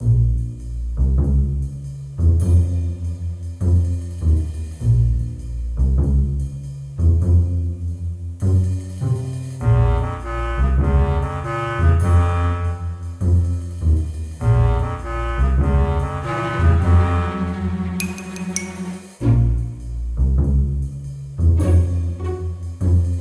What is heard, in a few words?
Game Music; bobtherobber2